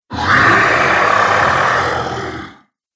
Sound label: Ice Dragon Rage, Monster Sound Effects; horror